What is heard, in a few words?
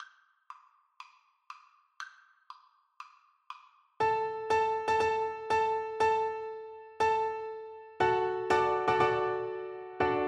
Piano Duet